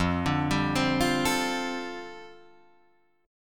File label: F 6th Add 9th F down Guitar Drop D, chord